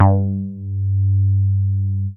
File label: G2, BASS; Q Up Arts; Dream Experience; BASS BANK 15